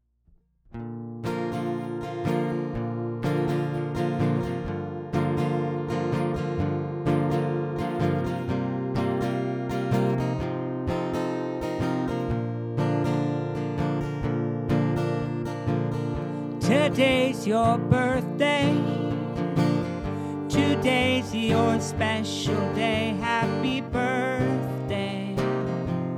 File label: Happy Birthday Song in English Guitar